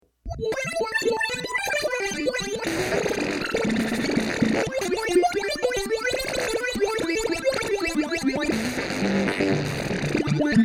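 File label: Filter Chant, Sequential Prophet '08, factory program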